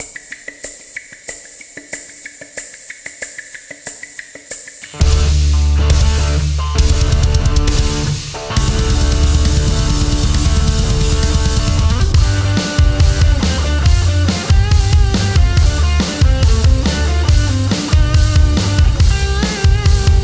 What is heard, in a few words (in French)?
night metal rock composition guitare instrumentale